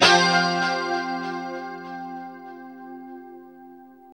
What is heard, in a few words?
2CHD F 4, Guitars; Spectrasonics; Hans Zimmer Guitars VOL-1; JC-SHEEN